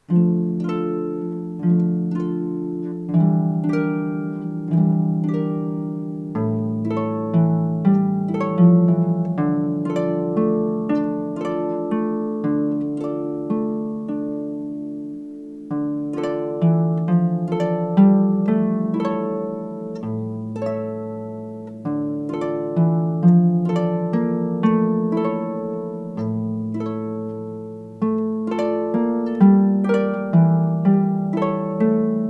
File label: Two Guitars